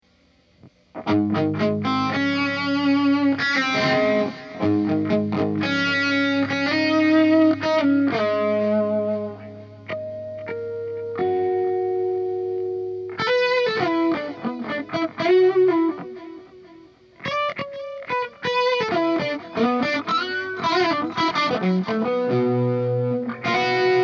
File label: echoplex preamp mods